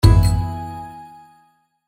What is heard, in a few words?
multiplier win; Eternal Wishes LuckyTap